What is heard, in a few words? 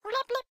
Globe Bird Idle